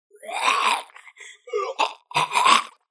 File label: Zombie Attack And Chew; زامبی